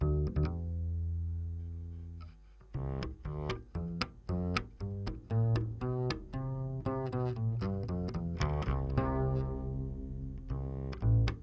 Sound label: Bass DI